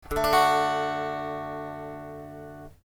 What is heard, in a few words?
open downstroke d major, guitar